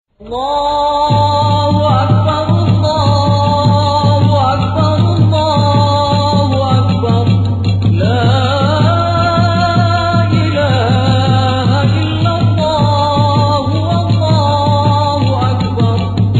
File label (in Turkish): Islami zil sesleri